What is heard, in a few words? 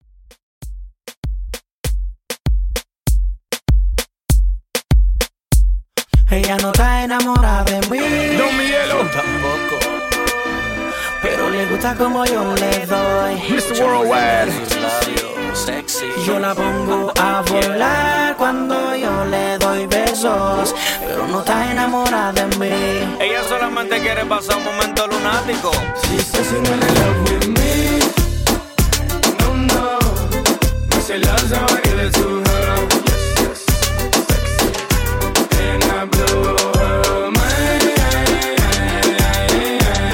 Moombahton 98Bpm